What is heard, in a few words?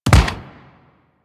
Weapon Punch Bow; Warframe; Weapon Punch Layers